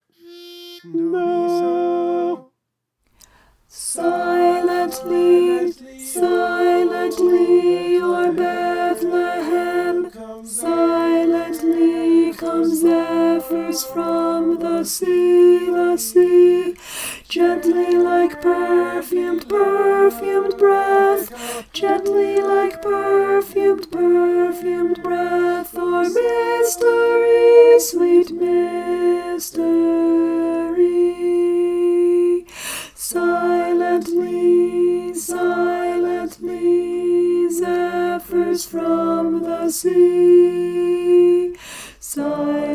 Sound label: alto